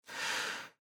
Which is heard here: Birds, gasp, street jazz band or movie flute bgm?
gasp